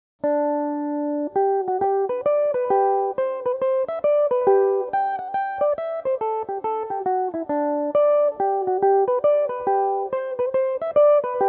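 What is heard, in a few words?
hornpipe G major, mandolin; (4/4); Belmuse EM-190 electric mandolin; Tom's Hornpipe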